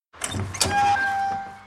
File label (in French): grillage; au secours du professeur